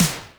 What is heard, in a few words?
snaredrum, Yamaha RM 50